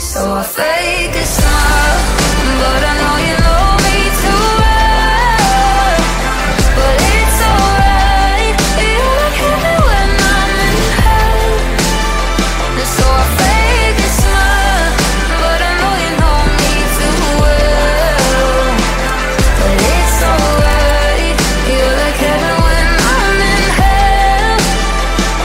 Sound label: english ringtone